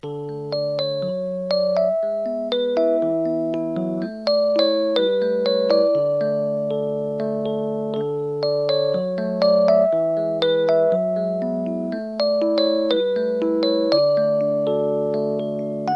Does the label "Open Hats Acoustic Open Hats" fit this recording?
No